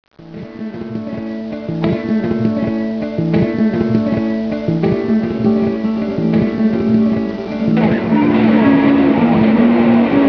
impro mono

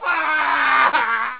short wah

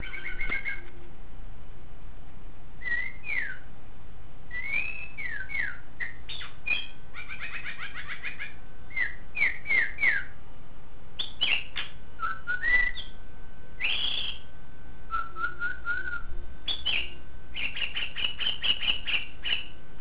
very soft singing

